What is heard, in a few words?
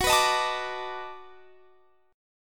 B 7th Sharp 9th